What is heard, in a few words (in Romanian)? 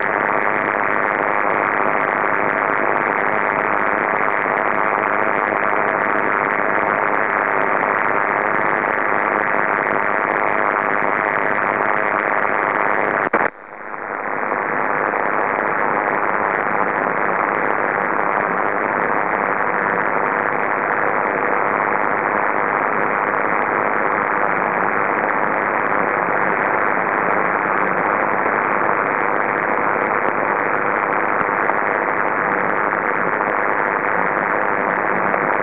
zgomot parazitar 83KHz CW; dcf77 vlf; Perturbatii LF